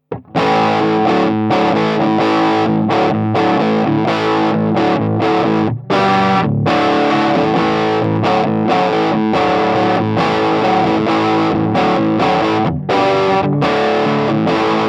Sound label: G12H Junior